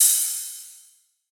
Ride; Cymbals; Ghosthack; Color Bass Essentials

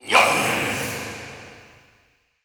Processed Battle Shouts, Viking Vocal

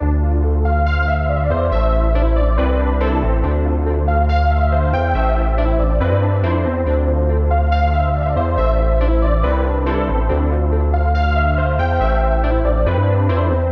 140 BPM A Min, OB6 P6, analog hip hop melodies; Manifest

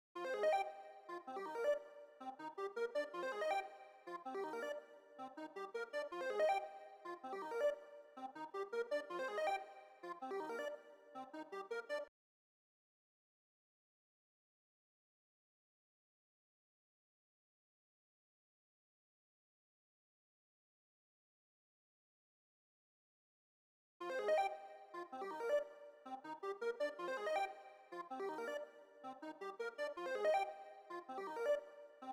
161 BPM C Min Arp; Melody; Loop Stems; Cymatics; 2022 Melody Collection - Wavy; Location

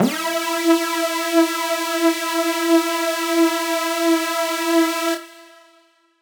Riddim Melodic Hit - E Lasers; One-Shots; Ghosthack; Riddim Essentials Volume 2

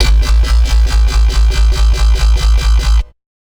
Bassline, Dubstep; GhostHack

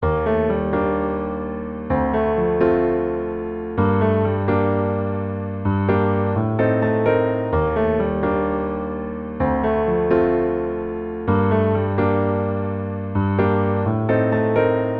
Piano Loop - 128 BPM G Min; Instruments; Piano Loops; Moonlight; Cymatics; EDM Starter Pack

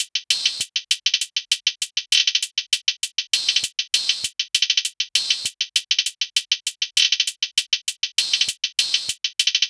Phonk 99bpm Top; EDM Drums; Drum Kits; Stems; Ghosthack; Drum Mega Bundle Demo; Ultimate EDM Drums; Phonk Kit 03

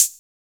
HiHat, closed Hi Hats; Drums & Percs

